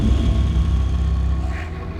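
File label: Music Volcano 120; from dust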